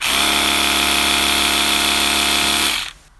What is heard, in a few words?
Electric Teeth Brush, Foley; Crowdsourced Sample Pack; TRUTH-HZ